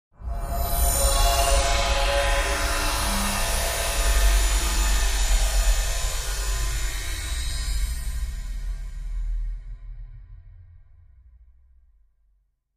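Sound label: Wash Mystical Electronic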